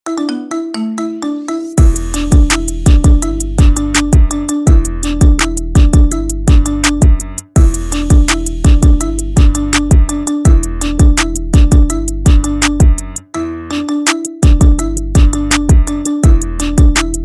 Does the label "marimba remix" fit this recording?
Yes